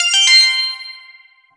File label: Propellor